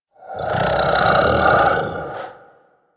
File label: Kubrow Vocal Snore; Warframe; Hunting Critters; Orokin Kubrow